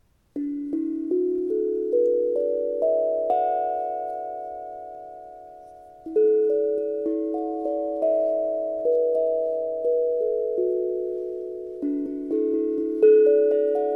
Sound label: Re major pent 432